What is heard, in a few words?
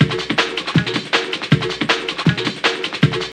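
drum loop; X-Static Goldmine 3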